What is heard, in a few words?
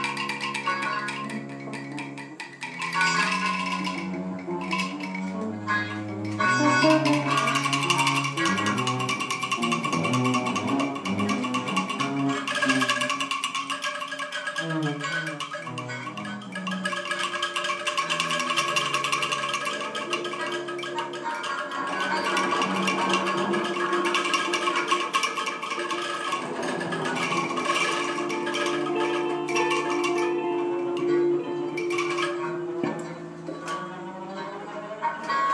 telematic quartet; brown rice